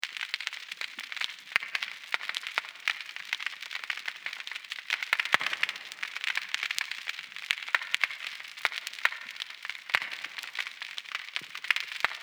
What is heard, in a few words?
Underwater